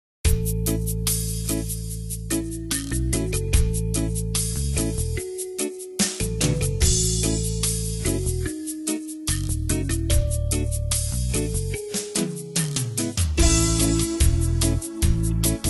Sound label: instrumental